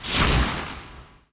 shock; RYL

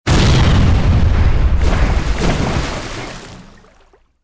Fire Ship Explode; Age of Empires III; cinematics

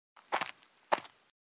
Footsteps; Age of Empires III; cinematics

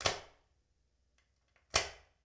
Old Light Switch On Off; Sony Pictures Sound Effects Series; Period Devices

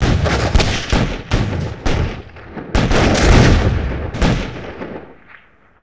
Musket Volley Close; Period Combat; Sony Pictures Sound Effects Series

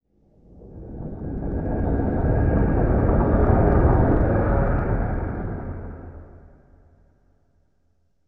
Reverse Stinger; Sound Design - Horror; Sony Pictures Sound Effects Series Volumes 1-10; Sony Creative Software

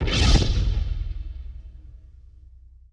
WWII Explosion With Flare; Sony Pictures Sound Effects Series

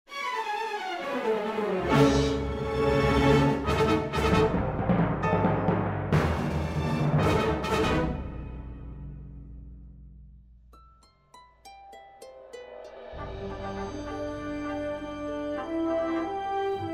Music; Age of Empires III; cinematics

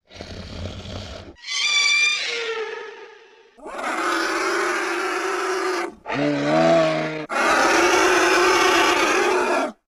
Lizard Creature, Vocals - Monster

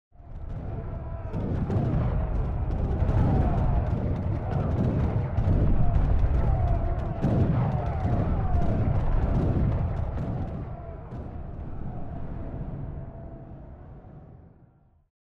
Battle Background; Age of Empires III; cinematics

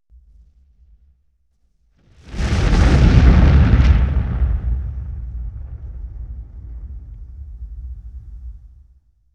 5.1 Explosion; Sony Pictures Sound Effects Series; Explosions - 5.1 Surround

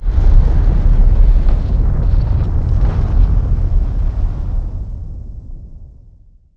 fireloop; RYL

